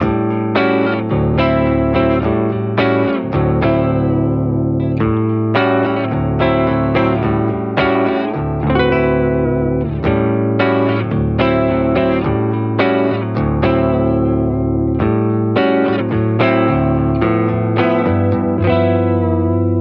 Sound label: Blues; healing soundscapes